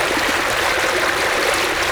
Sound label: emitter water stream